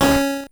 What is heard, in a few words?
multiplier C#5